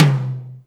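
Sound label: Tom Hi Power, Alesis D4 drum machine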